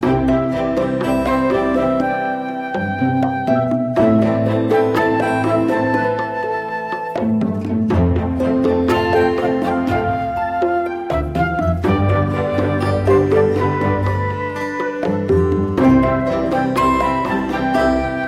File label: Exploration; Spikel; Calling All Mixels; 2014 mobile gamerip